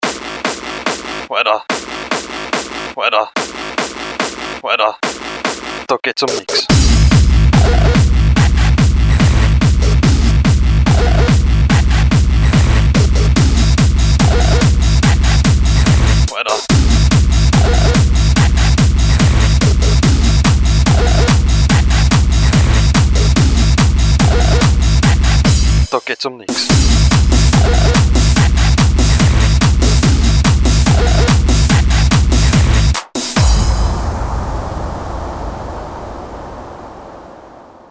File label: hardstyle